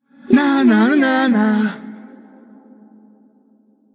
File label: nv tongue; smite; voicepack; hachiman